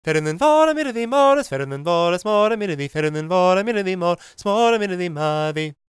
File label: GAELIC, World Voices; ILIO; Vocal Planet